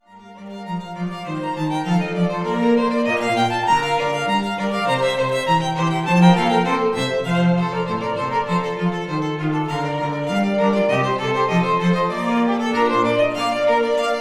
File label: String Trio